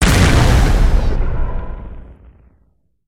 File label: Spider Boss Fire Rain Impact; creatures mechanical